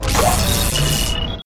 mech shutdown; lego ninjago rise of the snakes

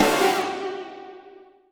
Combo Hit; Trapped - Ken Combo 1

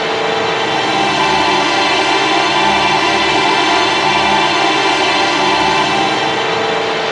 Music Stinger Stereo Loop; Plants vs. Zombies Battle for Neighborville; MushroomBoss

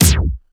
dj disco effect 1beat; Plants vs. Zombies Battle for Neighborville

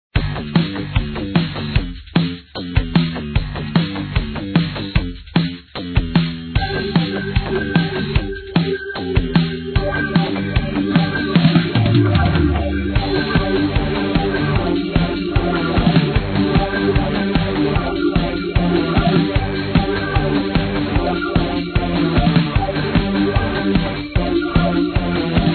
Music Drop

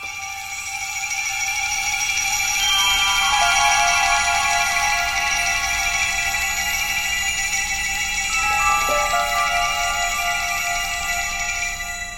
Stinger suspense; Back to the Future: The Game; Ep. 1 It's About Time

